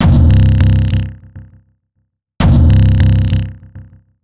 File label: drum